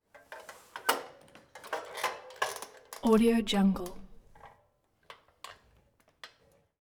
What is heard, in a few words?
tricycle movement miscellaneous roomy